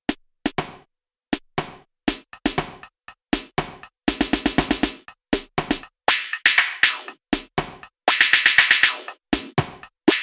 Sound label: No Kick; KOPRO+